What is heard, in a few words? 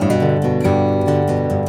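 G major, acoustic guitar 140 BPM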